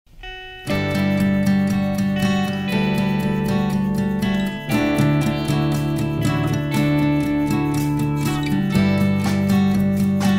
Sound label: easy ride; galveston